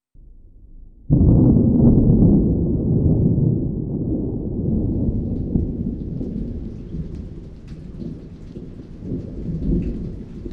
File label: distant thunder